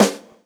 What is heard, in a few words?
rich lows snare g sharp key; Mono; snare drum one shots